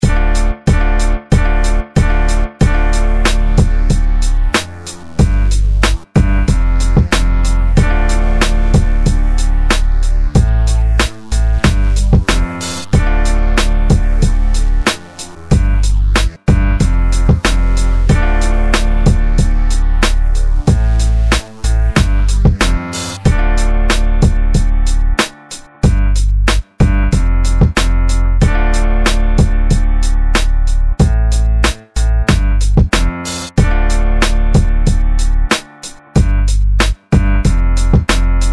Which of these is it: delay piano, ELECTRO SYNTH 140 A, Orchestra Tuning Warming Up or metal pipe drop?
delay piano